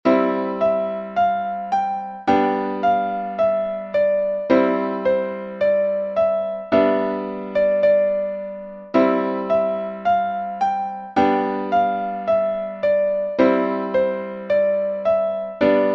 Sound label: ODE TO JOY High C 108 bpm